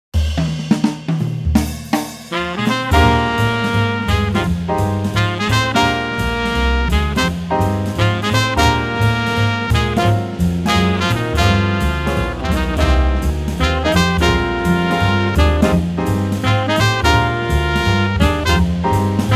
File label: walking bass